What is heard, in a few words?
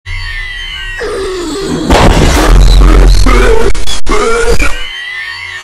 coughs and dies; springtrap